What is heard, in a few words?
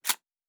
Matches Light; Survival Game Sounds - Lite Edition